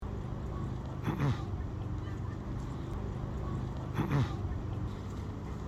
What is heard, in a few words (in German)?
schwaene schilf; see